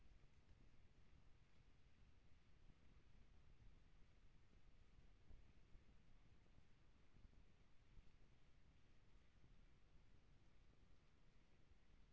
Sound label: HYDROPHONE 16000Hz; JASCO AMAR HYDROPHONE 740